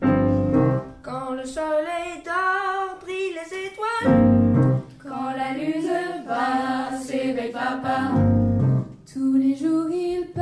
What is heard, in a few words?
jazz